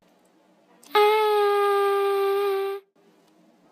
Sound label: Girl Says Aaaa To Open Her Mouth